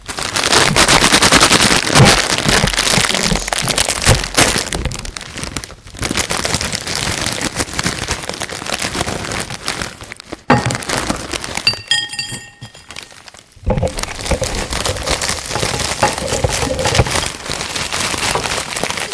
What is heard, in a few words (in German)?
Salzsstangen öffnen; Feuerkraft Salzstangen öffnen